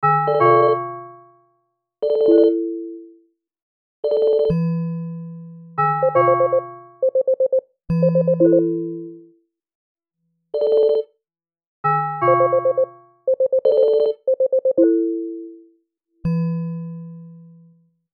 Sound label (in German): Funktionsklaenge Sounds Raum